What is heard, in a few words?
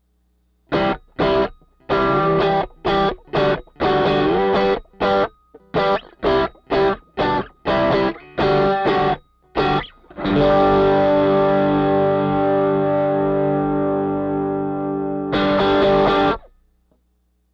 Panther Overdrive